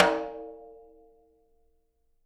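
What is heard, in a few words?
jazz snares; Sampleheads; Peter Erskine Living Drums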